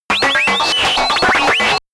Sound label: calculator high fast, roboter; comedy-cartoon; soundpool